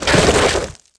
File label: revert dirt, skater; Tony Hawk's Project 8; XBOX